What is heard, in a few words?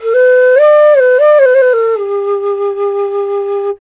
SERULING, Heart of Asia; Spectrasonics; SERULING PH